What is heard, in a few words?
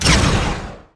Blow; Motal